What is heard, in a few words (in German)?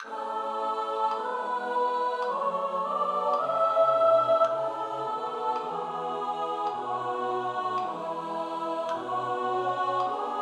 Zwar ist solche Herzensstube Sopran; Kantorei